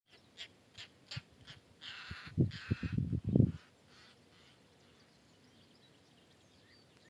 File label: Rollers; سبز قبا